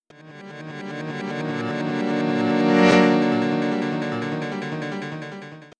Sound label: jingle